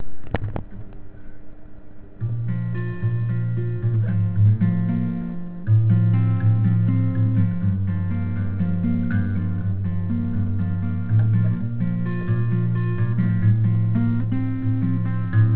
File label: Random Guitar thingy